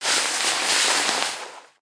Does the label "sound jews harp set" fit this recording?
No